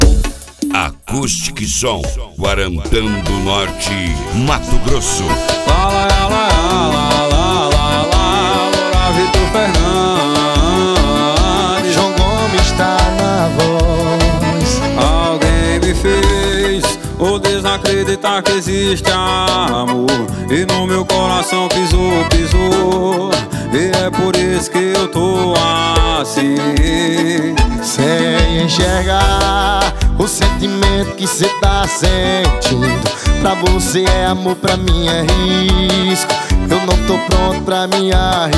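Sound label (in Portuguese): mega funk mix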